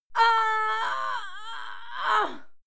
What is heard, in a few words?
female die moan heavy; schreie; menschen